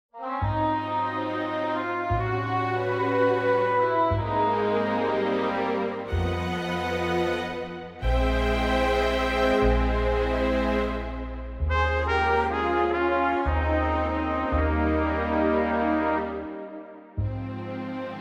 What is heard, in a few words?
orchestral